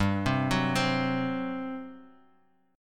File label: G Major 7th Suspended 4th; GM7sus4 Chord {3 3 4 X 1 X} arpeggiated